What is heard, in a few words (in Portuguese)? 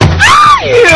aiiiii; vinheta panico na tv